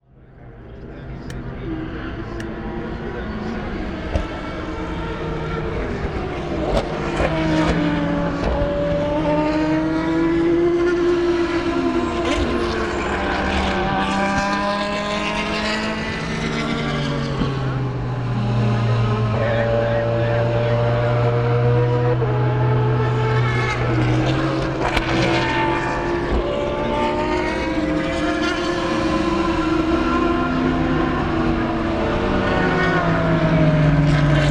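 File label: various cars after esses et qual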